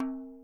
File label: CLAY FRAME, CAMEROON; AKAI S6000 CD-ROM; WorldSounds